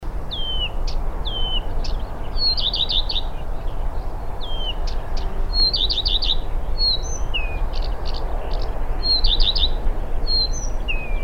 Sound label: mimicry male song